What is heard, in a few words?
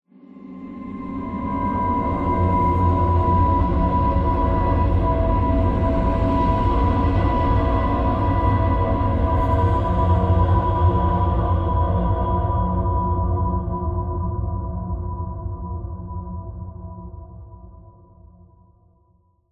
amb unique headcrabs; Half Life Alyx